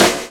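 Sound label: drum chop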